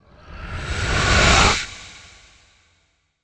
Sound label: Growls; Dark Magnus